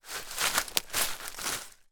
rummage leaves twigs forest floor; foley

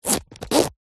purse pouch leather zip; zapsplat; foley

